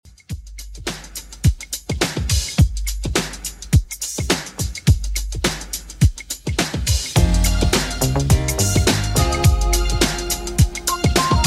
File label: 90s 105bpm; Redrum